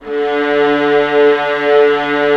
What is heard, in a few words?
Vla D2; Digital Sound Factory Virtuoso